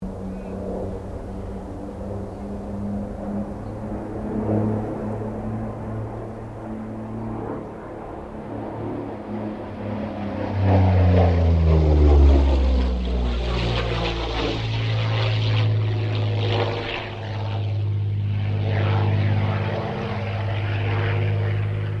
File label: AIRCRAFT P-40 KITTYHAWK PASS STEREO